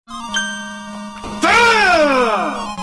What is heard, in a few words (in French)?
cling